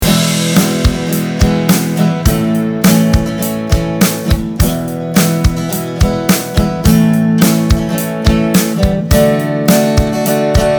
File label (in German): Gtr mit Drs; Logic